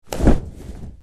Dracula cloak movement vampire whoosh

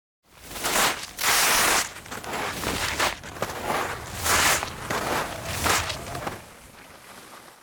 Ski slalom down hill pass by close fast

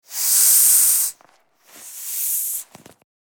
Rubber ring deflating air release; inflatable

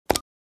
Large button

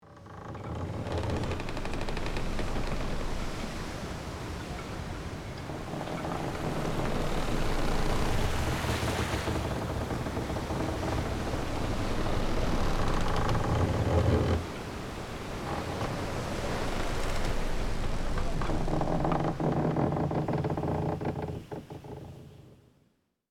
Pirate ship sailing creaky wooden ship

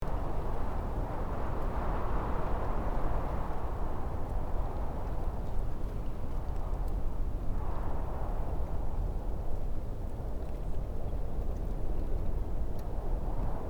Desert soft wind ambience loop

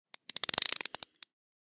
Ratchet wrench turning slow twist

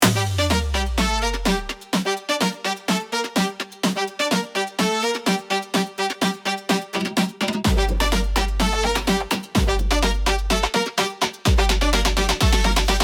Latin beat dancing

